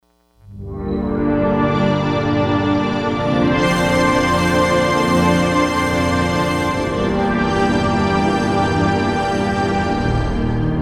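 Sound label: Slow Bell Strings; Digital Synthesizer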